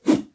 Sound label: Swinging Staff Whoosh Strong